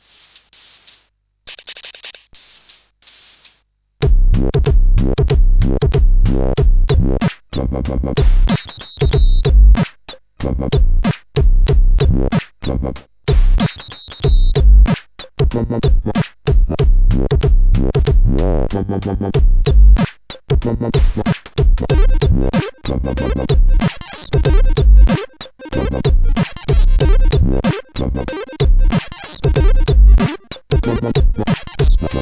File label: Intro sid CSG8580R5; Graffiti